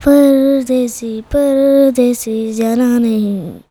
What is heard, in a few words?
CHILD, Indian Traditions